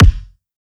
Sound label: kick cardboard, Drum One Shots; SD MIDNIGHT Sample Pack; Slate